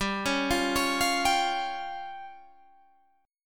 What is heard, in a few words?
F Suspended 2nd Sharp 5th G